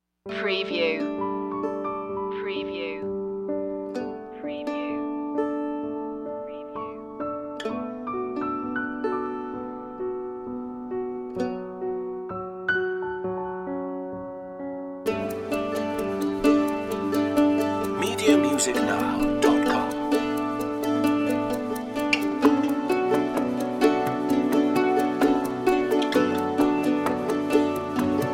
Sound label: peaceful moment